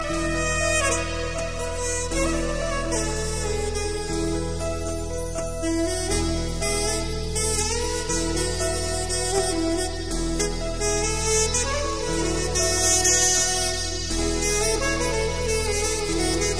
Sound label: trumpet duet; ringtones